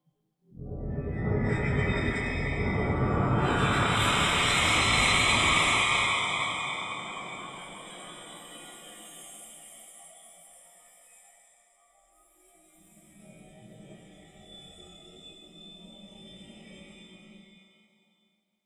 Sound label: Mystery